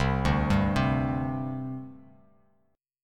C Suspended 2nd, C, down, Bass Standard; Csus2 arpeggiated

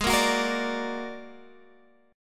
Bb Augmented 7th Ab, chord

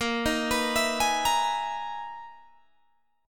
B Major 13th A sharp, guitar

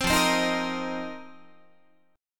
F 7th Suspended 4th F down Guitar; strummed

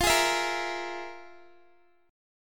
F Minor Major 7th Double Flat 5th Guitar; FmM7bb5 strummed; JGuitar

